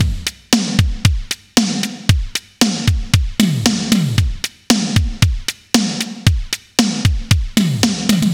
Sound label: 115 Beat Synthetic; Zero-G; Future Wave